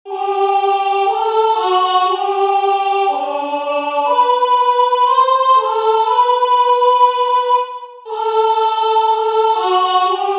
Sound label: soprano; NoËl Polonais